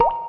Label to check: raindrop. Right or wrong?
Right